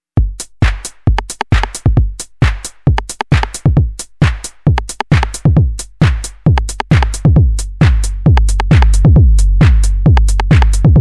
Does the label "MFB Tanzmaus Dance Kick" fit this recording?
Yes